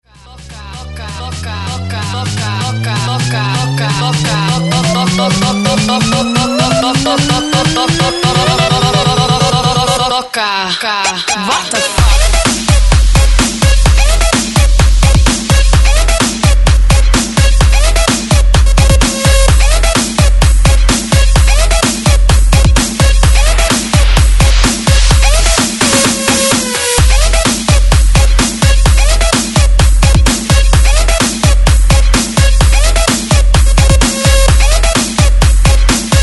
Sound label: 128 BREAKMIX; DJDOLLS